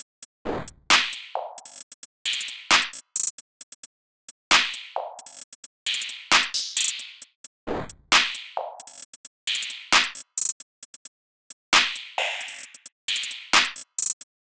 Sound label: 133 drum loop top